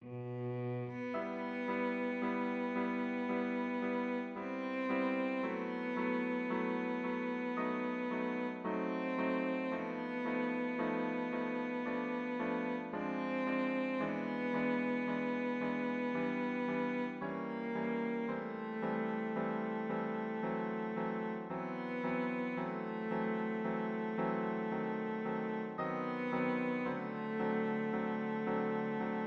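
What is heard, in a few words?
E minor, cello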